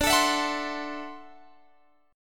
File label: D 9th D down Guitar Open G